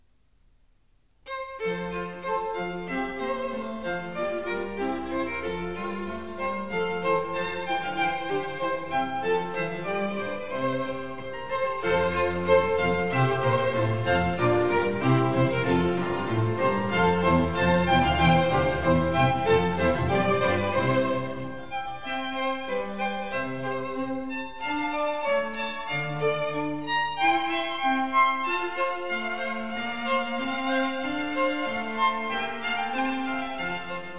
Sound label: Gavotta. Allegro, Conciertos Grossos; Concerto No.9 in F